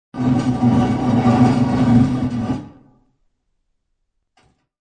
Stone door or stone coffin lid eerily slides open.; Part of Stone Doors (Sound Effects:Doors)